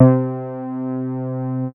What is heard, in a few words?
MOOG BASS C4; Best Service Dream Experience